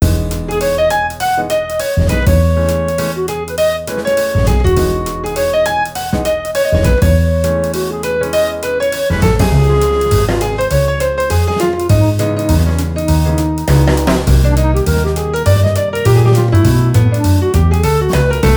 Jazz pack; Sony Ericsson; PlusFourSix; Akiko (W890)

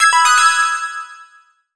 Flight alert; Sony Ericsson; PlusFourSix; SE Sound Project; Lars Edvall

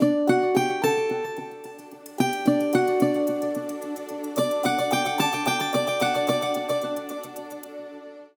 system ringtones; Amazon Fire-Phone

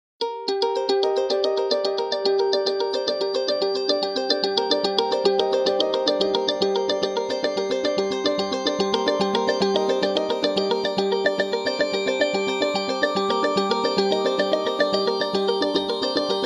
Resonant bliss; Nokia N8

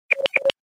Tweet; Alcatel Vodafone Smart II

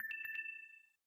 Low Battery in call

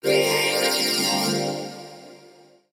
deep, notifications; Sony Xperia SP

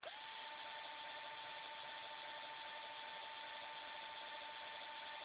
zoom; Alcatel; PC-Suite-4.17

